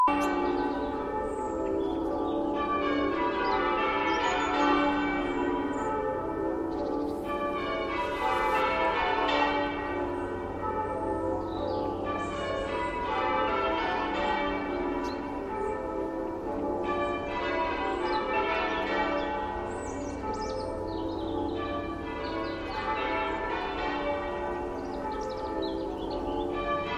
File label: Muffled Bells Outside